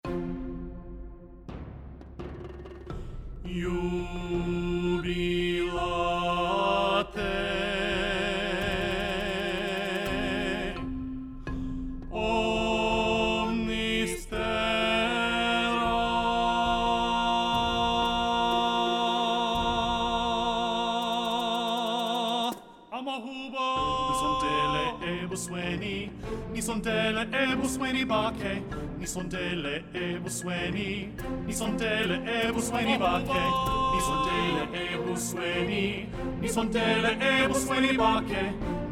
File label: Tenor